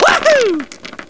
DICE

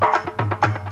roll; Acacia Educational Multimedia

